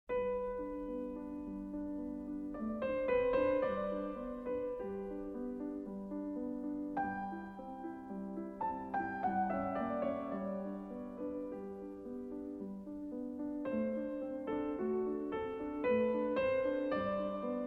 modern piano; Mozart

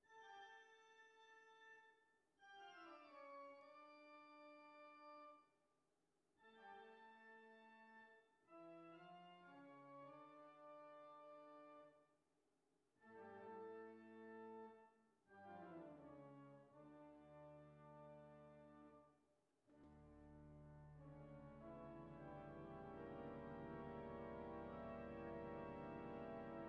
Earth Organ

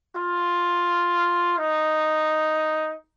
whole step

